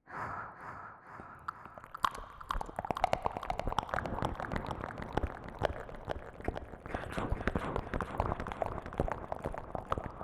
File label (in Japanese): 両耳舐めループ